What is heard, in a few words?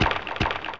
Skel Warrior Run